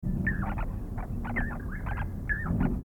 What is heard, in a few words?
clamator jacobinus; cuculidae; calls